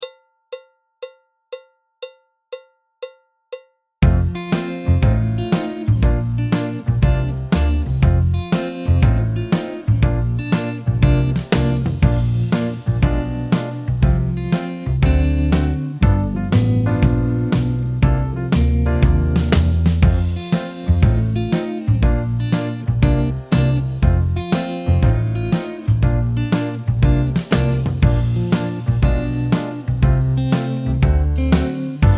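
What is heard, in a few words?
Swing backing track, Jazz; alto, tenor, trumpet, trombone, piano; Jazz Syllabus Level 3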